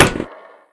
grenade hit; scmod

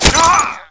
allied die; dod

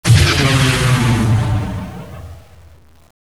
ION FIRE

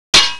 wrench hit

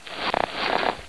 crawl

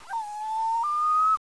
loon

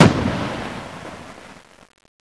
blast

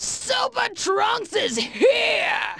scream; trunks kid

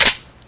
mac10 clip tap, weapons

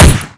metal mg, weapons

